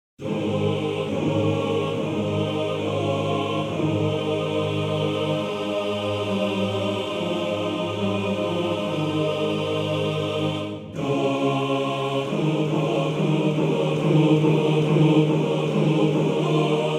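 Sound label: Mala Moja E Chor, Männerchor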